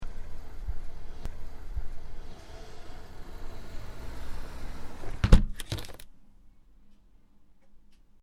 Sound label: Window Shut, Closing a double glazed window.; Household